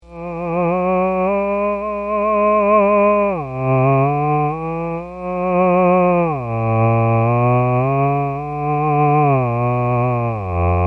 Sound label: talking machine "ah"